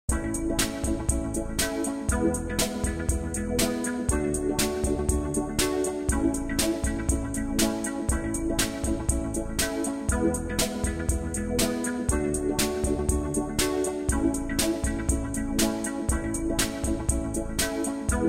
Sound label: Guitar